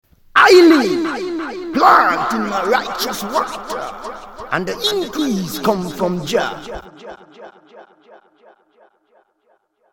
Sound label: roland dist; shootout